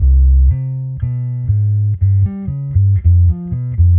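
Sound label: Bass Guitar 120 C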